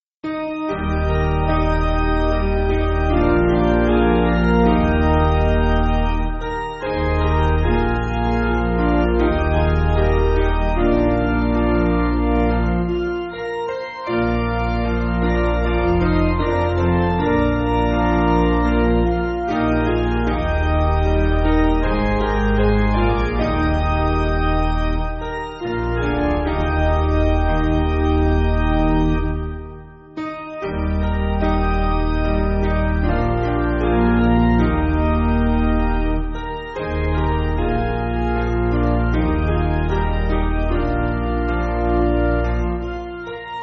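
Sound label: Piano, Music Backing; Basic Piano & Organ (CM)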